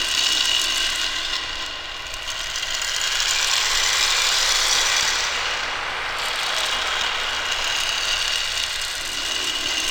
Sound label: Rainstick; Sonic Foundry (Sony Creative Software); Ma Ja Le Ethereal Textures; Noise Percussion